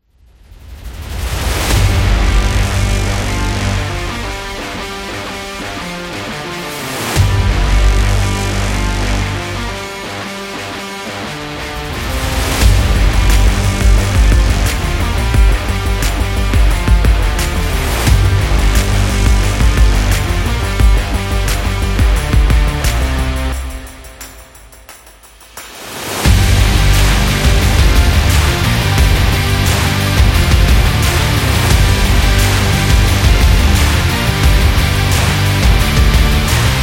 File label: Rock Big Beat